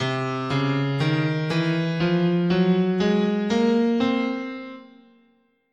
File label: C Eight tone Spanish, scale